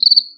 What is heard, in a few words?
Crickets Cricket